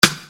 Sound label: Percussion, EuroDance